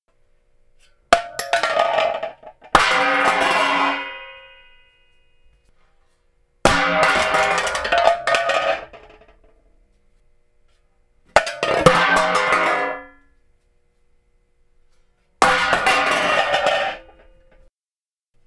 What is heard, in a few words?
Falling Pots And Pans